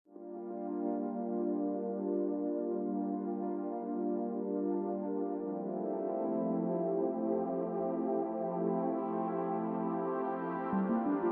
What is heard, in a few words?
Pinball Party Intro